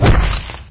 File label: strider step; scmod; synthcrab